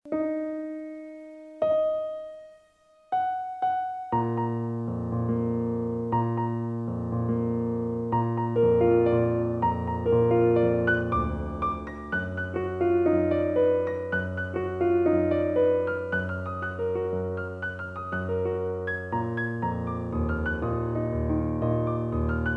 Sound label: Piano